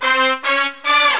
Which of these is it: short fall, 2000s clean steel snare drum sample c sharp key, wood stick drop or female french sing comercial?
short fall